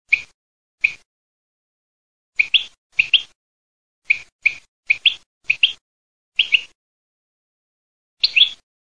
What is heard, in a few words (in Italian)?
cardel campagna, birdsound